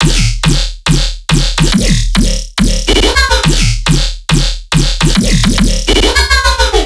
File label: Riddim Bass Loop 140 D# Groove; Dubstep; Riddim Basslines; Ghosthack; Advent Calendar 2019 Day 17; Riddim Bass Loops